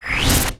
Ball Warp Out; gamerip

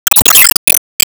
Gauge Complete; disney star smash android ios mobile gamerip 2020

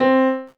PIANO

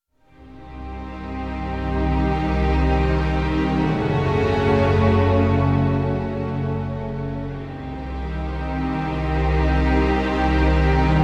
Dungeon; Kingdoms of Amalur Reckoning

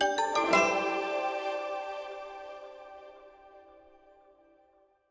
stinger Chest Opened; lego horizon adventures

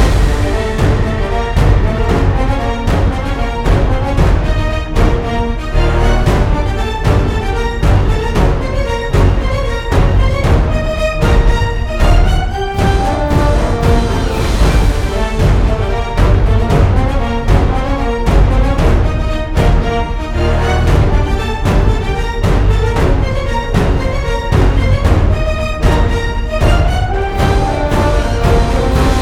Action Loop

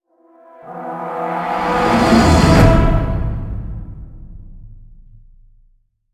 combat end

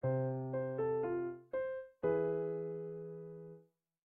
Start Level Screen Music; JustAxe

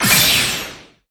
Team Skill Trigger

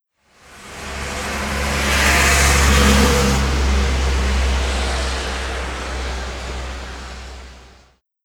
Gateway Arch Car

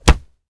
Kick; Anima Gate of Memories

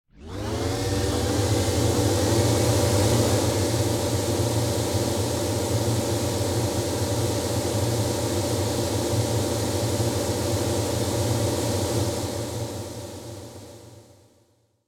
Boss DroneWave Initiate; Avie

